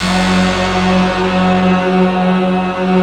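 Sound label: F#2, rough stick; Best Service; Advanced Media Trax Modern Composer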